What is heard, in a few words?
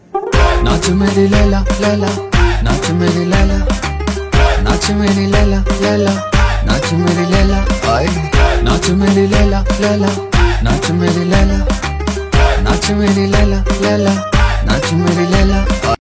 Ringtone